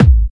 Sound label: Bassdrum; Best Service; House Definition VOL-1